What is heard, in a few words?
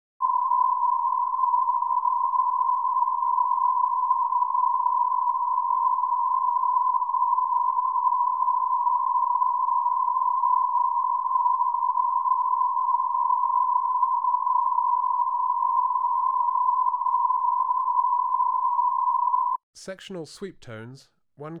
1kHz reference warble for sweeps; dbx test